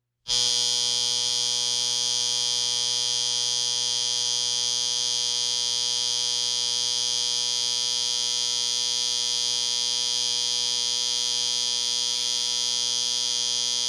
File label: Alarm On Electric Clock